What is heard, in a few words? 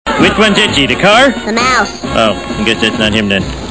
violence